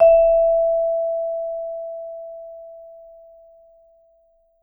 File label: Vibes, E4; Sampleheads - Dave Samuels Marimba & Vibes